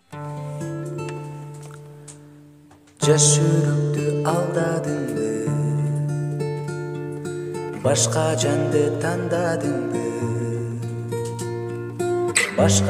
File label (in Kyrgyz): gitara